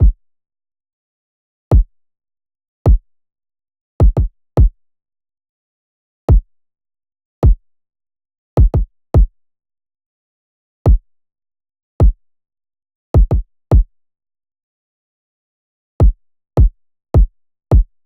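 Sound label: Kick 105 E minor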